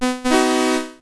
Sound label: fight; fargoal